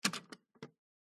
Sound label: household stereo hifi tape cassette deck eject open